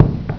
knock

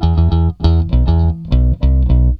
BASS, ROCK POP; MAGIX

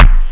TYPEWRI; CLICKS

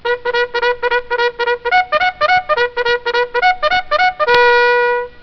BUGL CALL

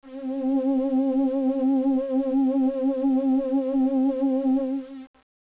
PAD

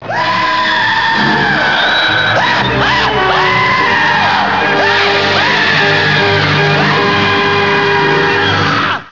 SCREAMS; EFFECTS; EXCLAIM

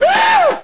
SHRIEK; PEOPLE

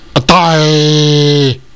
DUUUHHH; PEOPLE

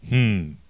HMM; MAUMAU

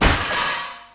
FENCE

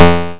PLUCK

